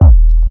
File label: BD, B. DRUM, House Side Of 2 Step; USB Soundscan vol.51; AKAI